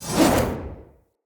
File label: Long Sword Whoosh; Weapons; Mother